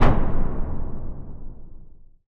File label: warship attack cannon; ships siege